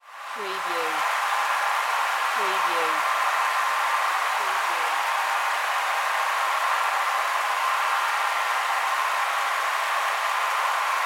Sports Stadium Crowd Cheer Applause Long Distant

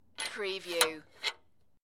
Metal Latch In Out

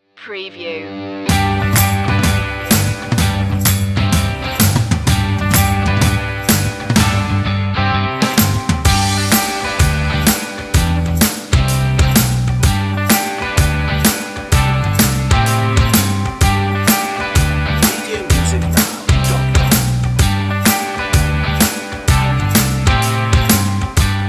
no vocals

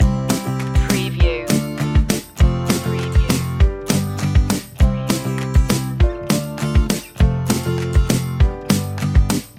happy like a kid loop

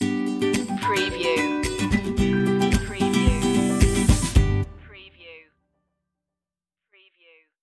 easy travel stinger

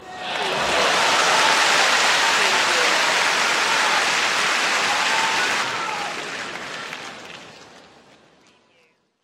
Sport Crowd Cheer

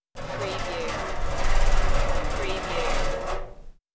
Metal Grinding Short

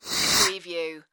futuristic short swish

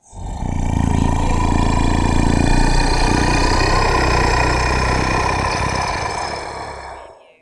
Monster Animal Sneer

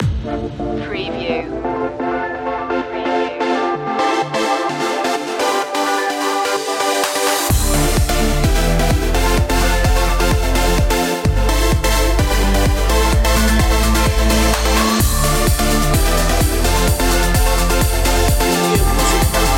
trance

